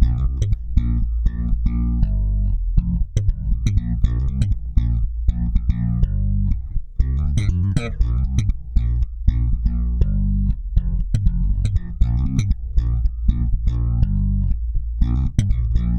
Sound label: FREEST 120, Bass Grooves; USB Soundscan vol.30; AKAI